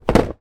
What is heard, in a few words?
leisure game box down on surface; zapsplat